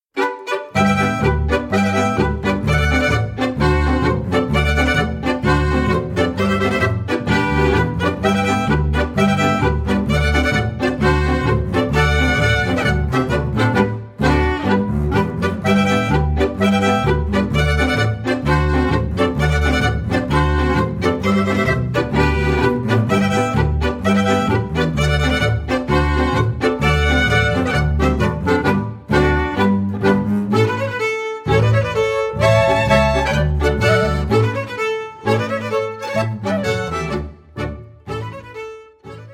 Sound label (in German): Polka